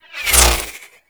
Cluster Shot Whizzby; Enemies; Abilities; Warframe; Teralyst

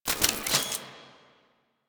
Alt Mech, Blastor Pistol; Warframe; Weapons; Corpus; Plinx

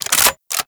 Clip In, Shotgun; Warframe; Weapons; Strun

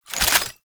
Shotgun Reload; Tenno; Alchemist Shotgun Deluxe

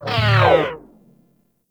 Proj Small Fire; Warframe; Lizzie

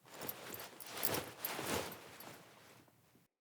Foley Emote Default Beckon, Default Clothing; Player

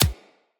Bullet Impact Hit Notification Two; Weapons

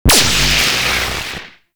Powerplant Tesla Coil Hit; Gameplay; Warframe; Corpus Railjack; Tether Power Plant; Ambience

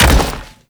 Step Default, Foley; Warframe; Sentients; Erra

